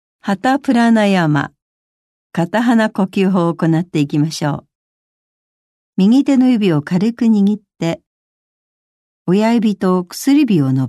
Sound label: alternate nostril breath